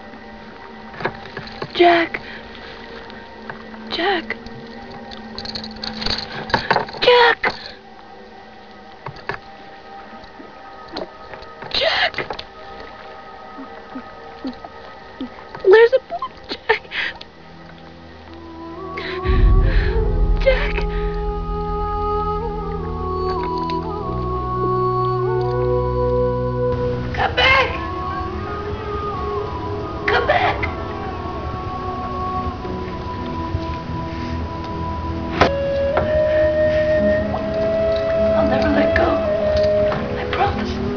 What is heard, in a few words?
windows shutdown